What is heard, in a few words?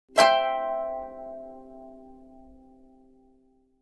High and sweet chord strum